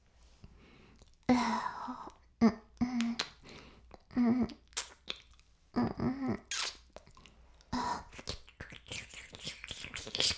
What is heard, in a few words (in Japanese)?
耳舐め音声; 白くて黒い魔性円環